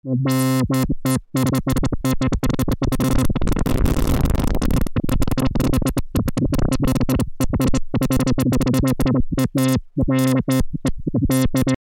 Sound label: lfo filter rand, synthesizer basics